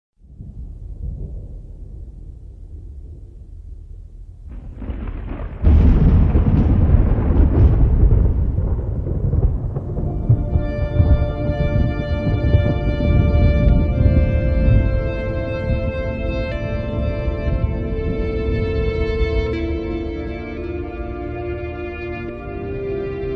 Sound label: sounds of guitar